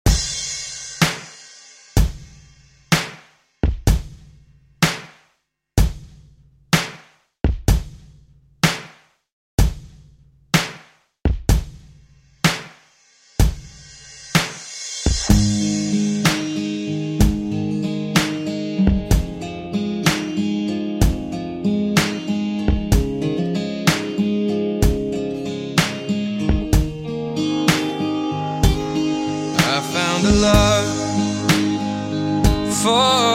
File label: Remix 126Bpm